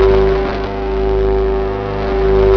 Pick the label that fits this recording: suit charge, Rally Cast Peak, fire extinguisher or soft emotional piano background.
suit charge